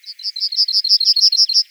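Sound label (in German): goldamm 3, yellowhammer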